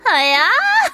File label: polka